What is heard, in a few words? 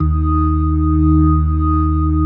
EB2S, B3